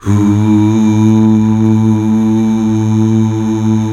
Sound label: UUH